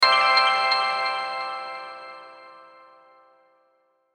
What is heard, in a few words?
Hit Burst Piano Delay Shimmer; Production Element Imaging Element Accent Transition